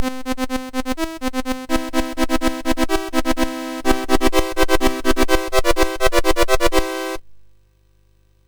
Rocket Ball, MOS6581R4; GAMES

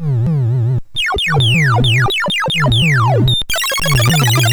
sid MOS6581R2; Paradroid

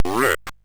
sid CSG8580R5; Cave of the Word Wizard Set 4

